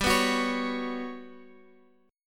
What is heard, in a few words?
G Major 7th Flat 5th G, Guitar Open F; GM7b5 strummed